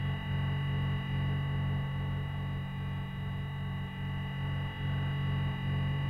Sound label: Light Hum; Warframe